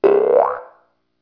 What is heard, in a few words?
boink